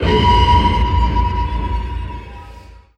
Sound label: Jump